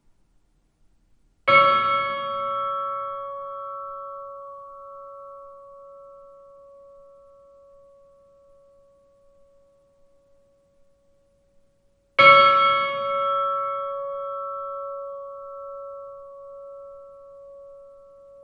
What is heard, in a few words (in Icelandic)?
midgardakirkja likhringing, kirkjuklukkur